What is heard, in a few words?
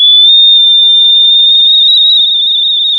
pop, sound of a tea kettle whistling